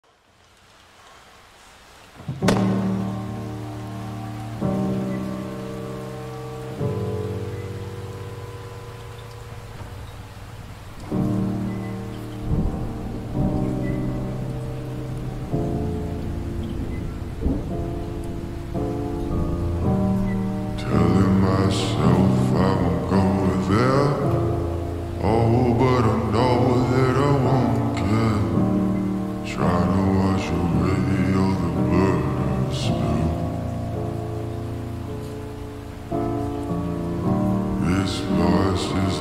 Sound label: slowed reverb rain